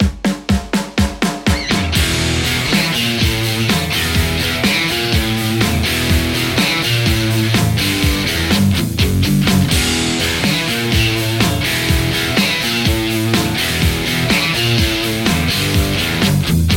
strat, JCM800 hot; mercuriall

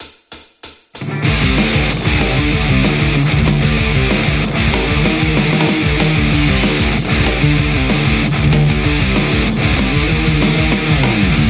metal tele; igniteamps nrr1

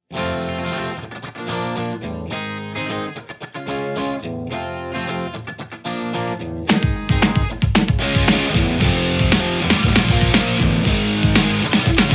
Les Paul JCM800 hot; mercuriall

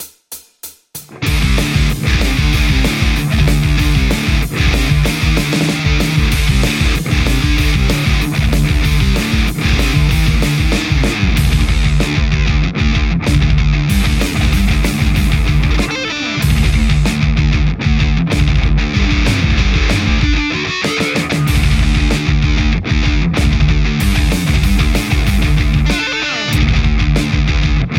metal strat

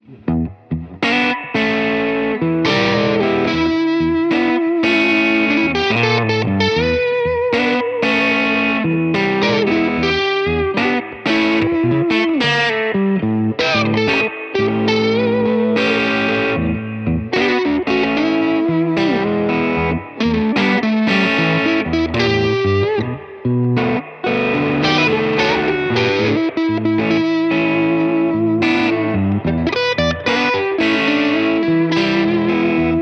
blues strat lecto; poulin

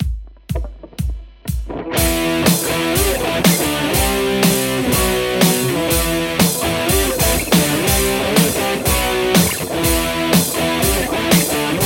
lespaul; igniteamps emissary

